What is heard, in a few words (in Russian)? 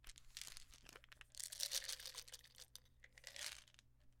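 sound of pills rolling in a bottle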